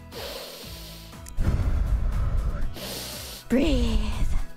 breath; IRyS

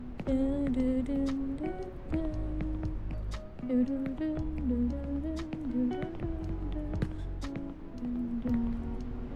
humming; ID Entity

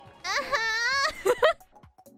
hope noises

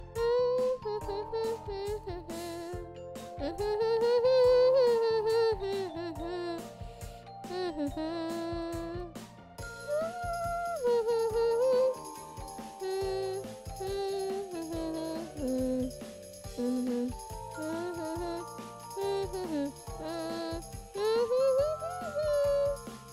humming; stream BGM

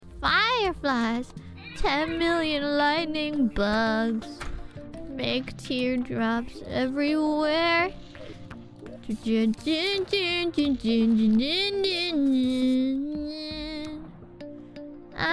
Singing; IRySinging

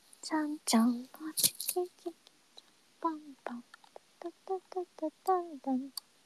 humming; IRySinging

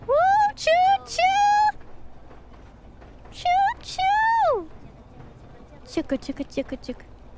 choo choo

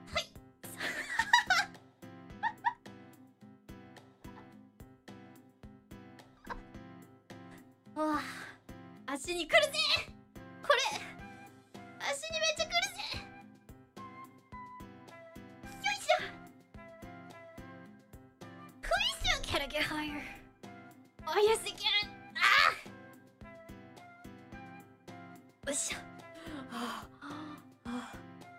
doing lunges; noises

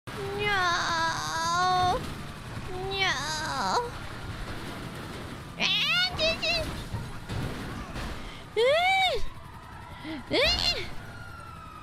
hopeless noises

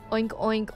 oink oink

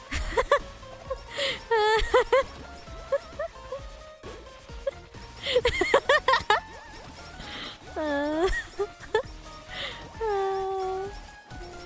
laugh

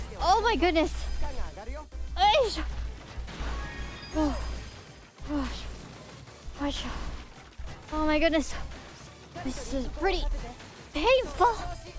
NOISES NOISES NOISES

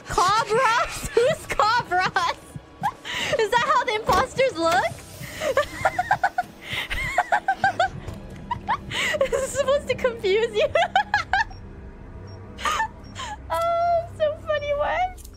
Laugh; IRyS

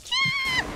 Scream gyaaah; IRyScream

